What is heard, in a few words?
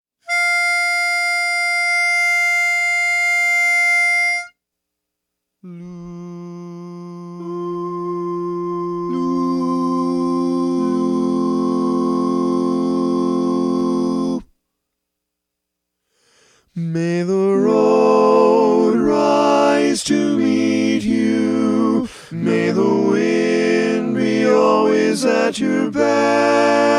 Lead